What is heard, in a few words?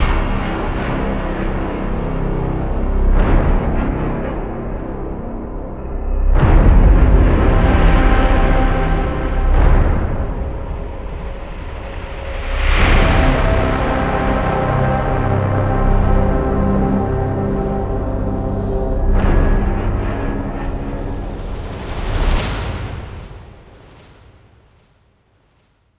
Start Menu Loop; extinction deluxe edition; ps4 windows xbox one